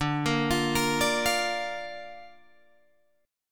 D Minor Sharp 5th D Guitar Open G

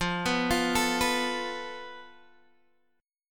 F Major Flat 5th Guitar Open D, chord; down; chord shape

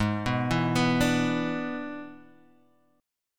E 7th Ab, guitar

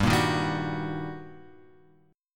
G Minor Major 7th Sharp 5th, G; GmM7#5 Chord {3 1 1 0 X 2} strummed

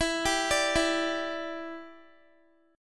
E Minor 7th, down, Guitar; Em7; arpeggiated

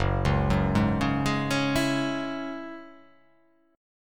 F sharp 7th Suspended 2nd, down

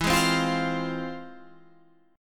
F Minor E; Fm/E strummed; JGuitar

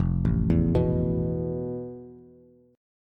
F Major Sharp 11th, Bass, Bass Standard; arpeggiated; JGuitar

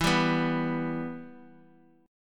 E-Minor; x,4,3,2

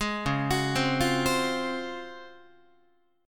C sharp Major 11th Guitar; C#M11; arpeggiated

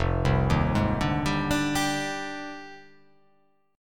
F Minor 13th; Fm13; arpeggiated; JGuitar

